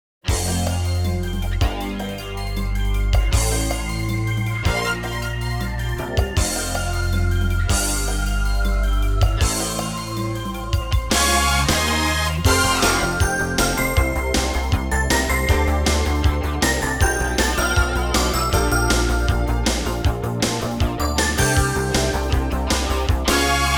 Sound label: Karaoke; Hudson's CD Game Music Collection 93